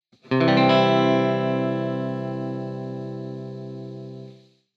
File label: C